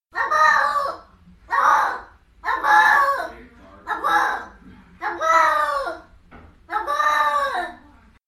cat scream

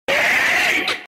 haank

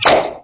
pluck

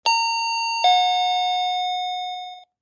bell ting nong

